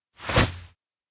ball throw; anemoi

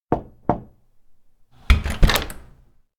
knock then open; anemoi

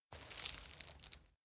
pendant grip; anemoi

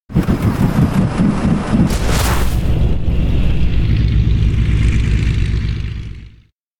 elec plane glide descend; anemoi

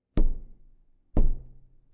knock

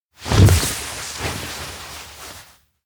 new hall belly slide; rikka; anemoi

